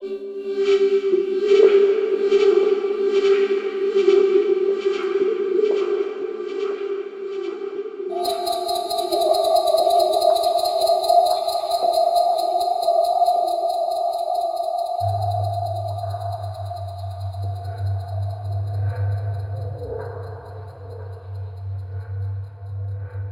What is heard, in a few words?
Echo Kalimba